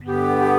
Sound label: alto flute alto flute morph